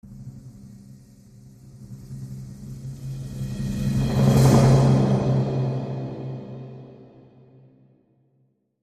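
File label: Orchestra Crescendo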